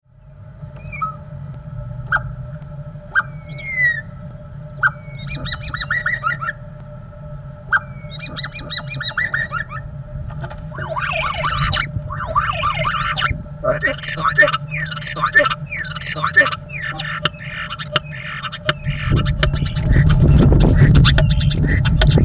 starling

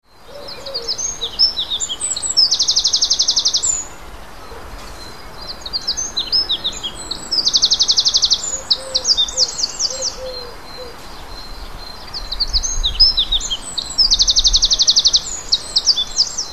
wren

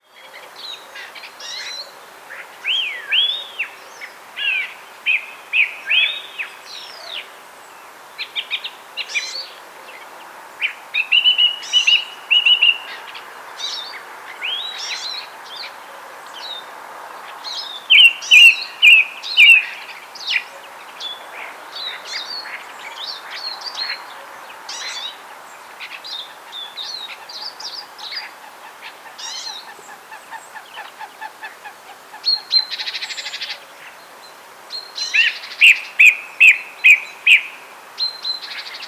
thrush

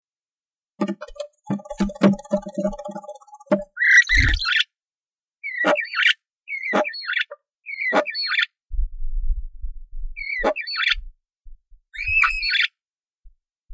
starlings

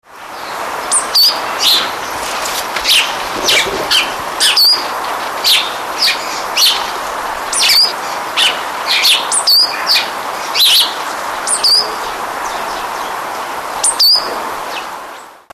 coal tit

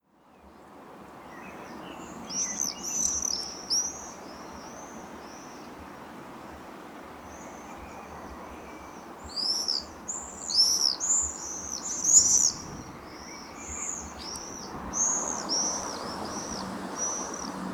swifts

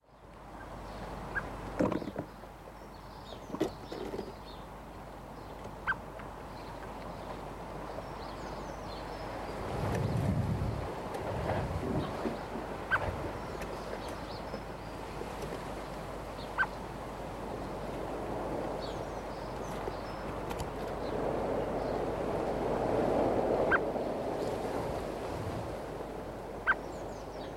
blackbird male

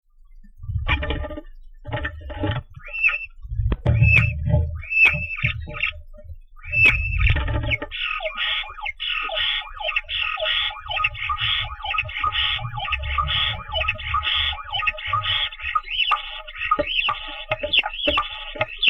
starling amb

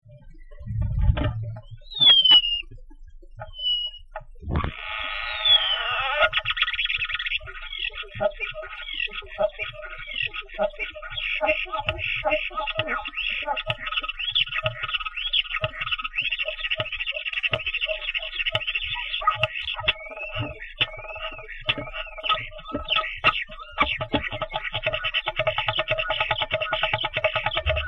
starling amb

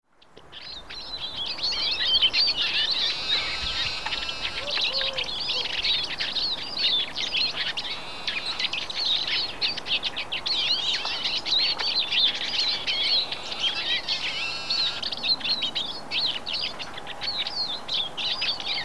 siskins

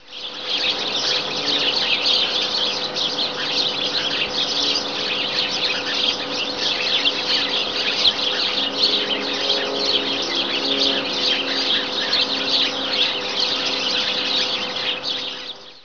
sparrow song

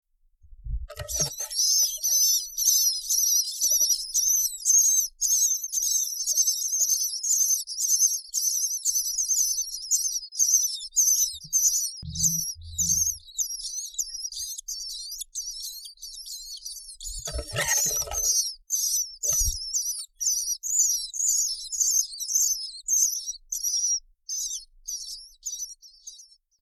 starling chicks

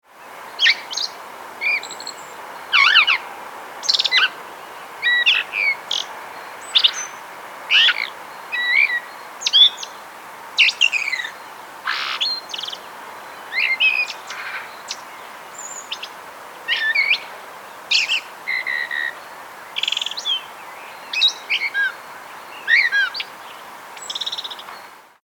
blackbird

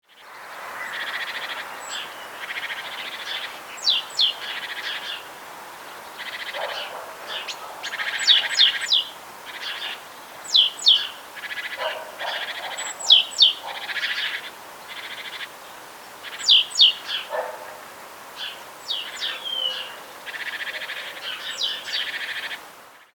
great tit

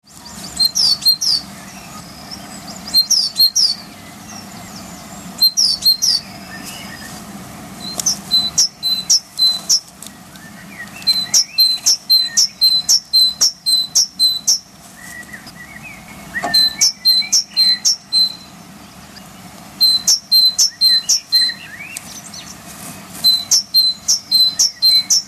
great tit

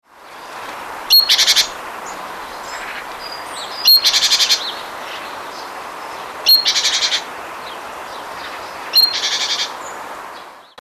great tit

